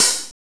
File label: C CLSD LOOSE, HATS; Zero-G; Funky Elements